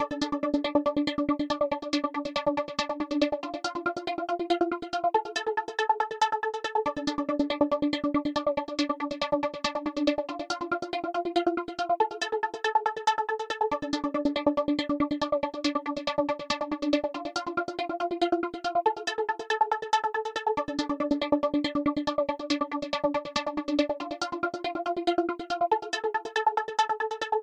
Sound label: acidy arp